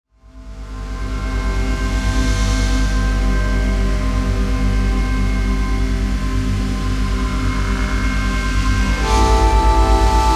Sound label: Music from Organ